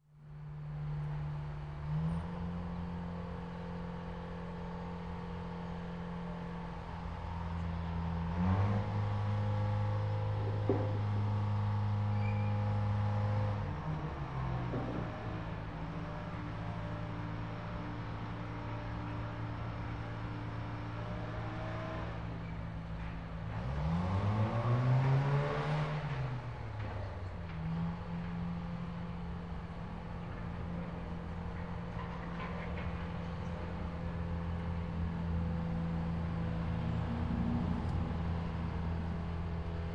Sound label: Truck Engine Noise Distant